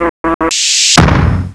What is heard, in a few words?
Death By Mad Bee; Koji the Frog 2.0.1